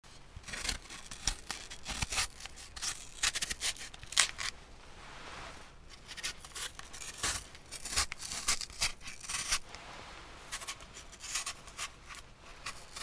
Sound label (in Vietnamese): dao got tao hoa qua